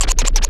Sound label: Scratch Up; Mo' Phatt; Digital Sound Factory Mo' Phatt